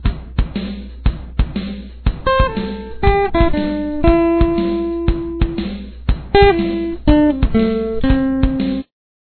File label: guitar